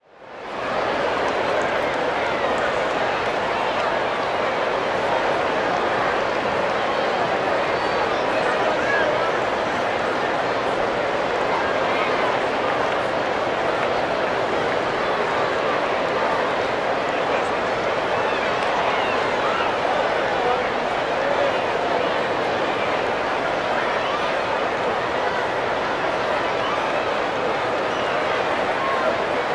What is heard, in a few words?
Stadium Large Crowd; crowd walla; Sound Ideas; Walla Series